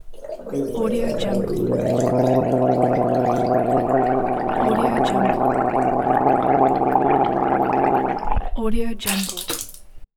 rinse throat

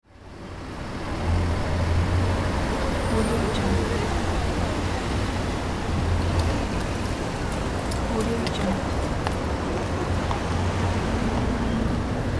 city ambience; hot dogs

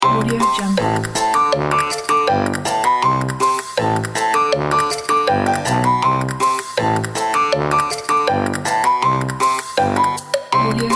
funny logo

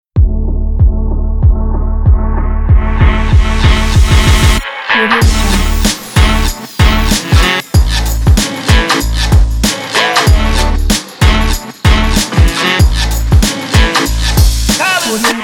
hiphop

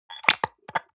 object bathroom soap dispenser press squish; smartsoundfx

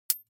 object metal midsize thin hit chink

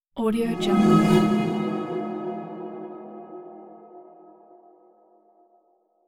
air pipe pass by

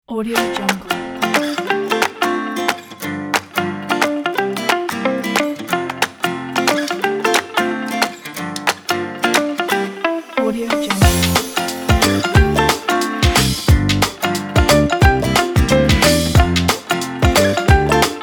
happy advertising music pack

